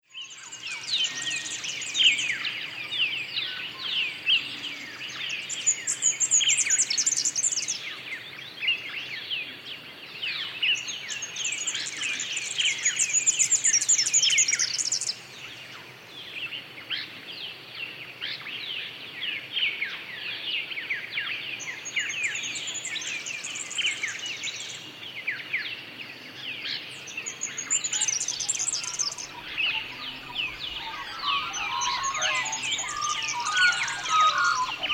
dawn chorus

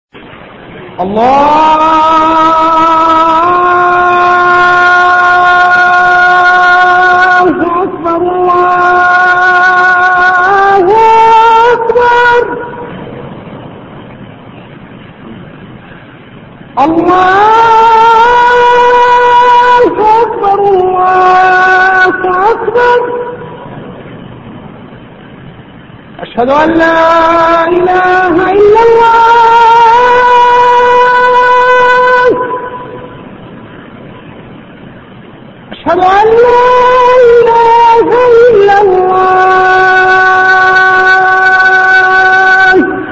Medina adhan; Calls Invocations

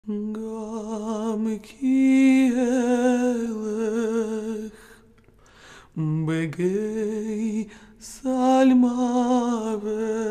Psalm 23 chant

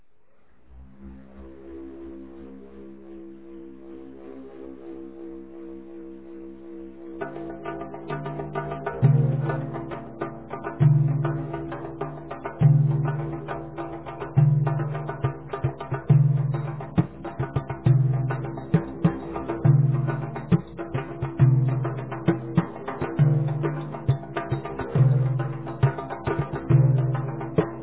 bullroarer

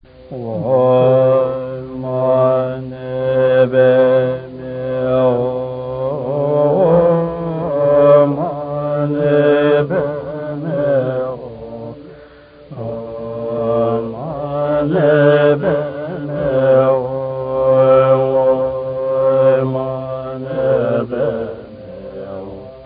Om Mani Padme Hum; Calls Invocations

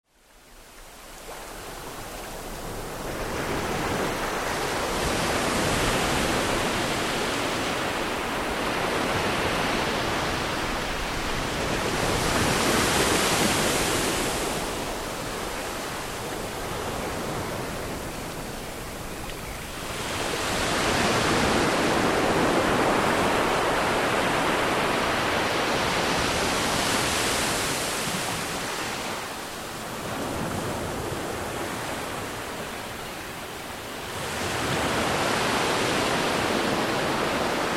ocean wave

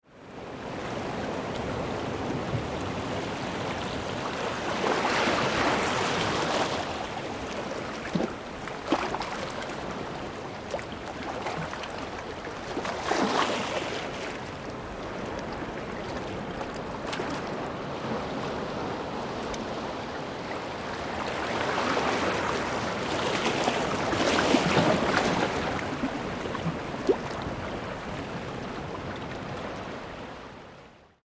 rockpool

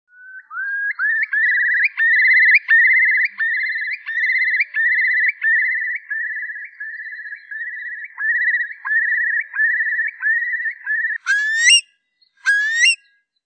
curlew

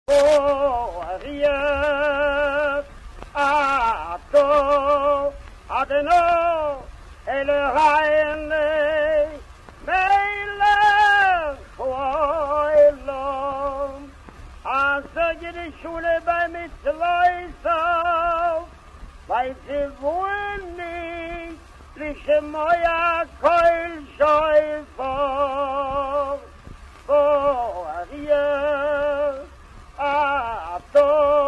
shofar; Calls Invocations

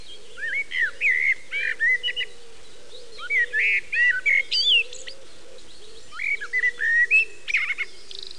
Blackbird

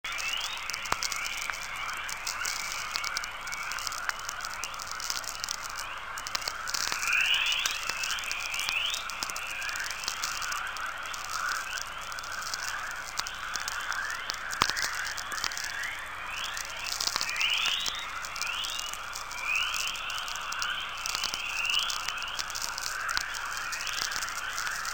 chorus; Alberta June 1998

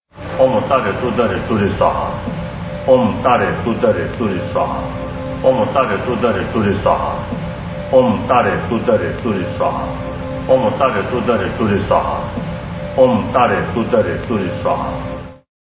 Tara Mantra

